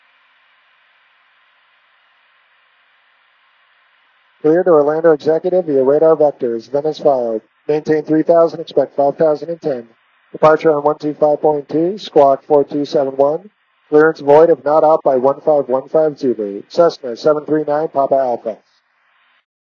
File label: Cessna 172 Z4 with AINR; Zulu 4; sound comparison